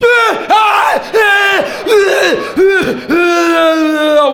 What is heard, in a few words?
bunch of small screams; ooh ahh